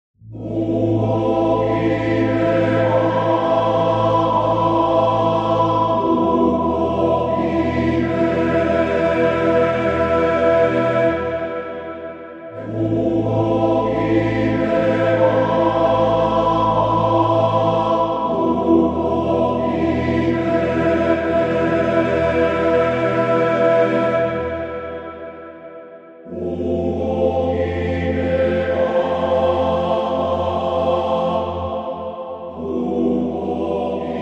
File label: voices; Kingdom Of Fantasy; Voices: Gregorian Choir Line 2